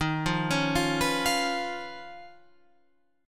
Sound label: D sharp 7th Suspended 2nd Sharp 5th Guitar Open F; arpeggiated; JGuitar